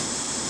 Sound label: skate; TileWorld